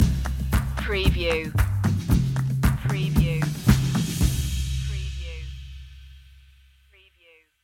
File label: turn that frown upside down stinger